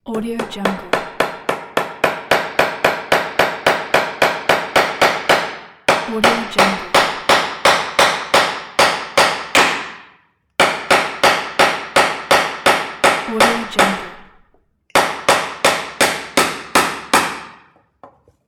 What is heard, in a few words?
hammer a nail